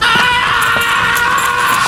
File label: AHHHHHHHHHHH; mlp/ Dubs; Memeish and Reactions; Brandon Rogers